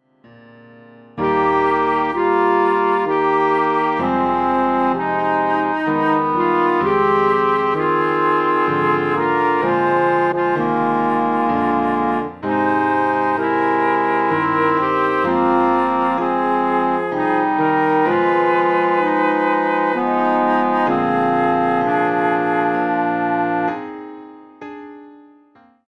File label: Chorale